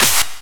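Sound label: Clap snare; Kicks and Claps; Running In Cirlces; Vengeance Samples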